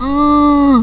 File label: pain; Cube